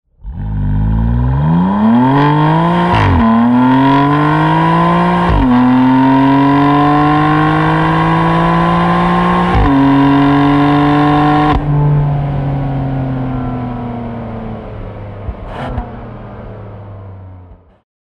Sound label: BMW M2 Competition, ringtones